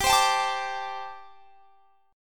G Suspended 2nd Suspended 4th; Gsus2sus4; strummed

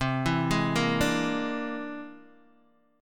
C Augmented 9th, Guitar, DADGAD

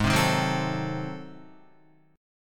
G sharp 7th Flat 9th G sharp down Guitar, chord

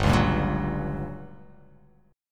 G Minor Major 7th Flat 5th, G, down

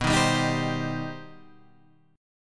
C Minor B; Cm/B strummed; JGuitar

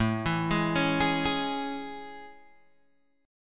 A Major 7th A, Guitar, Open G

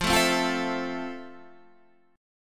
F Major 7th F

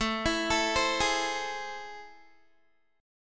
A Minor Major 7th, down, Guitar; AmM7; arpeggiated

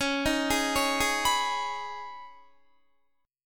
Db 7th Suspended 2nd Db, down, Guitar; Db7sus2 arpeggiated; tuning=4E,4A,5D,5G,5C,6F

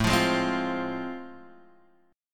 A Minor Major 7th Sharp 5th, Guitar DADGAD

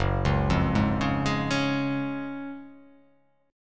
F sharp Major 7th Suspended 2nd, F sharp, down

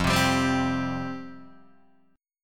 F Suspended 2nd F Guitar Open D

